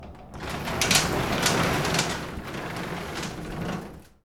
garage door open

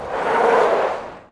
Kart Brake; android; Pac-Man Kart Rally

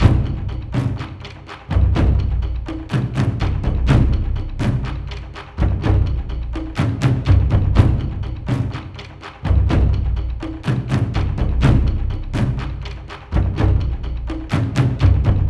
Drums; For Honor

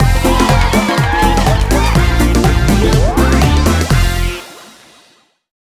CHEER; Buzz! Junior Robojam; PS2

